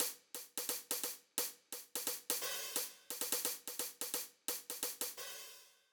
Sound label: 174Bpm Hat Loops